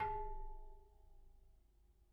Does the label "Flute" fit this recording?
No